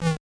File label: missed punch